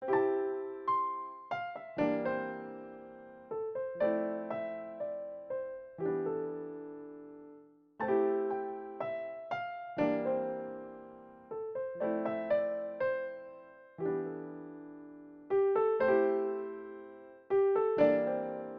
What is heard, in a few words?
piano